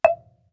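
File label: Marimba deadstroke fortissimo E4; MIS Pitches - 2014; Percussion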